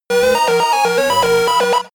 game arcade race futuristic

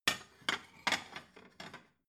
plate shelve slide soft

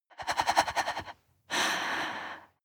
breath female stutter

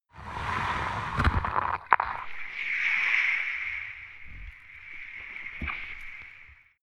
abstract beach

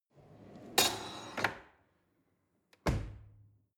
drawer cutlery close rattle

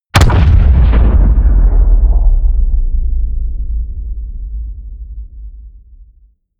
explosion

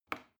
plastic button small push regular

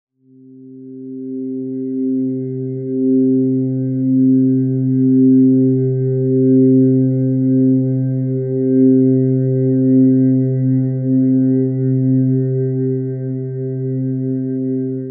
deep neutral tone

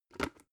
plastic bowl grab

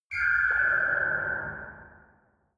ghost birds

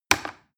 plastic button little click heavy fast

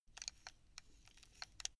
buckle rattle short slow

